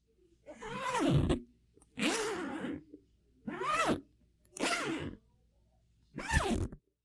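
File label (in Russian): звуковой эффект застежки молнии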